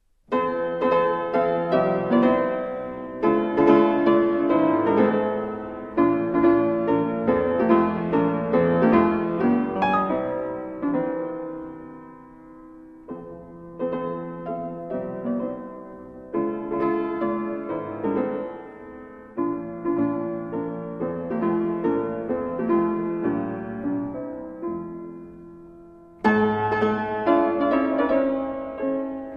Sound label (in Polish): MAZUREK F-dur op 68 nr 3; KONKURS CHOPINOWSKI 2020